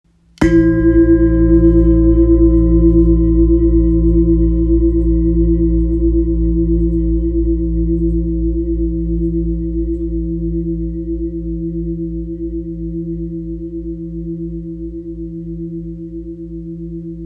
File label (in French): Bol grave la diese 8.17kg; fleurdevie